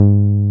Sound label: 303, TB-303 BASS